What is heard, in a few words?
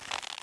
leath, punch